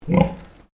Animal Pig Landrace Inside Grunt

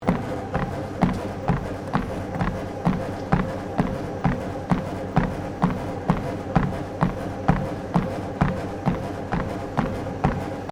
GYM TREADMILL WALKING 6KPH LOOP; Various gym machines; Equipment (Sound Effects:Sports)

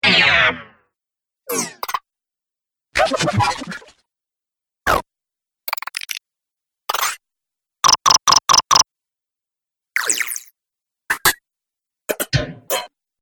Robots; Robot Sound Effects

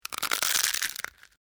Dry Brittle Snapping Long; Various Organic Foley sounds; Human

